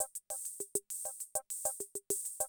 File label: CR78, DrumLoops